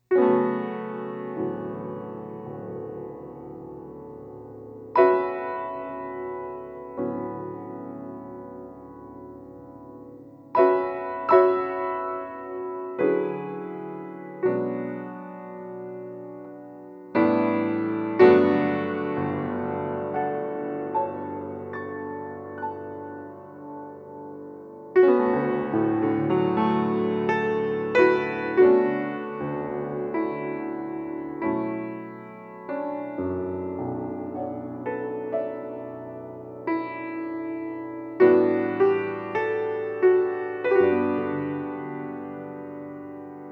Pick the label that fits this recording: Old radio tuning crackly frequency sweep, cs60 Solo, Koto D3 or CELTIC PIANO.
CELTIC PIANO